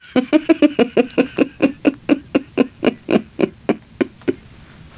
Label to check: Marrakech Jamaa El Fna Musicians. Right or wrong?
Wrong